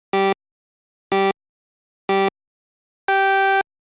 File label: Racing game start countdown; mobile game